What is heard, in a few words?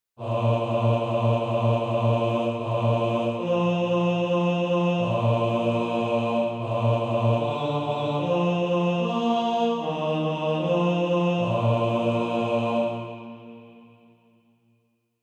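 bass, Practise Music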